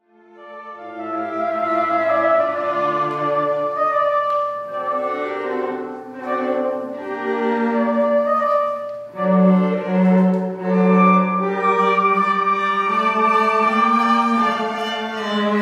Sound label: Oboe Quartet; Wolfgang Amadeus Mozart; Oboenquartett KV 370 2